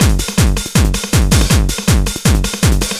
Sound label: LOOP, Eurotech; Sounds Good